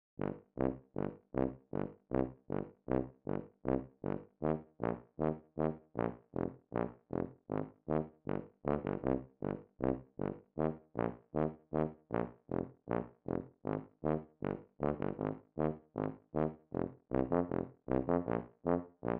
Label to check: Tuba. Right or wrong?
Right